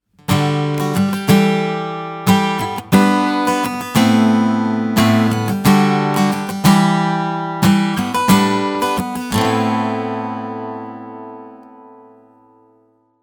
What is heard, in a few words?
Mid neck chords example, acoustic chords; 30 acoustic chords